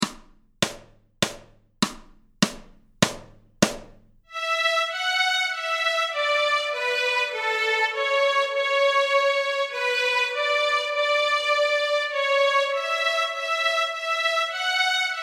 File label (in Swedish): Törnrosa Stråk A, Fiol; Notträning